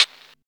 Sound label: Hihat Sample A Key, Royality free hat tuned to the A note. Loudest frequency: 3675Hz